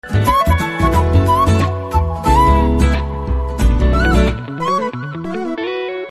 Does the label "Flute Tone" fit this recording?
Yes